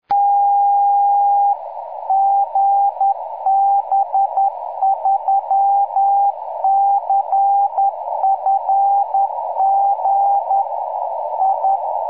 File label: beacon, 4m; GB3CFG